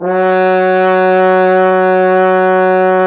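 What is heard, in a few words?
French horn; Sound & Vision - Gigapack I CD 2 (Roland); Brass&Woods1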